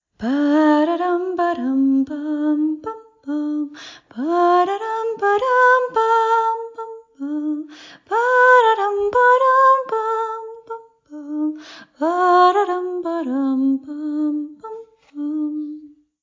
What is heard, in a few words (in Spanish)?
Miau Miau